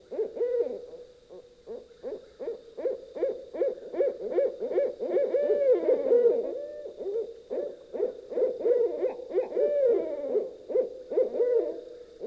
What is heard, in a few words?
Owls Hooting